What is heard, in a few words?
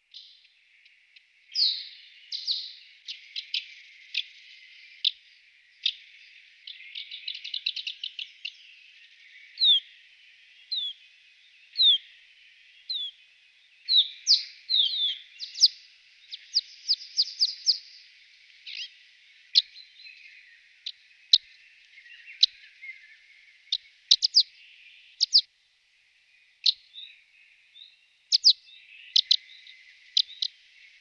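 skalnaya lastochka golos, crag martin voice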